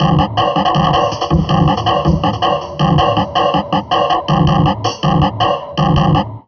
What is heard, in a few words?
Drum Loops Crazy Processed